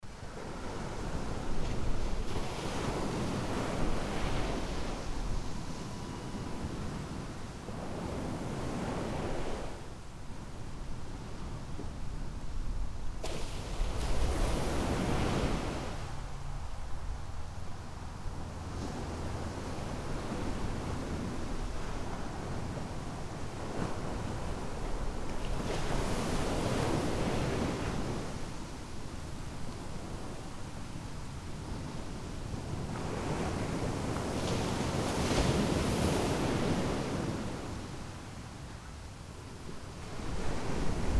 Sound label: beach waves at night; ellis beach